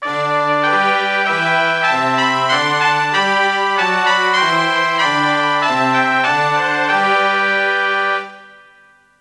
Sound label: Fanfare; Worms Armageddon